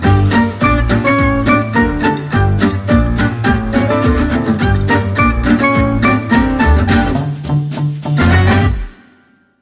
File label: Long Sting